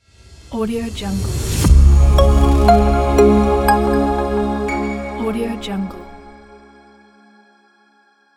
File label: logo intro